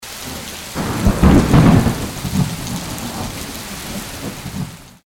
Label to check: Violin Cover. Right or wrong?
Wrong